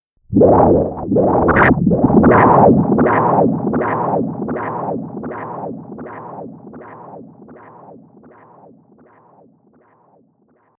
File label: Chaotic Electronic Wind, whoosh; Swish, Zoom, Swipe, Whoosh, Swoop; Andrew Fleming